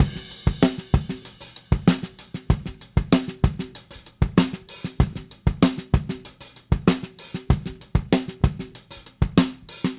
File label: Drums Crash, 70s Analog Funk; Sonic Foundry (Sony Creative Software); What it Is!